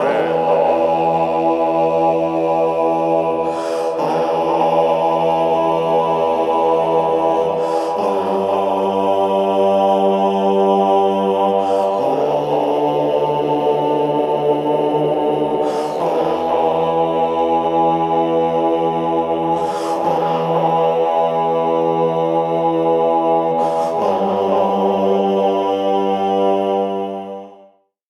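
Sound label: Monastery Phrase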